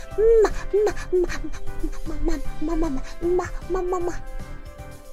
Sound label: kissing sounds; yukihana lamy